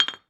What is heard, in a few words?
Brick Drop Concrete